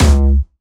C#2, Jumpstyle Kick; Bassdrums; Vengeance Samples; Jumpstyle Multis

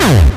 Acid Sounds H, Synths; Vengeance Samples

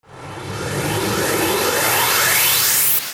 Uplifter

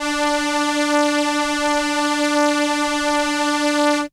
Violin D4, ARP Solina, synth; musicradar-synth-samples

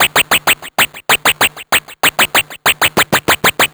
VFX2 Loop 128 BPM

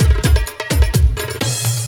Fill Ins 128BPM; Vengeance Samples

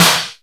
snares; Vengeance Samples

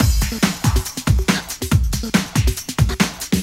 Loops BB 140, BreakBeats; Vengeance Samples

